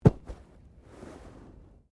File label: Body movement Fall Slide Light; Body movement foley to simulate falls and deaths; Wrestling And Struggling